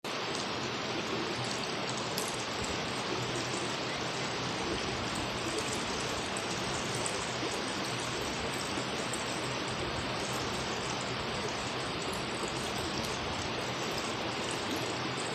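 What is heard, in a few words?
river stream flowing water nature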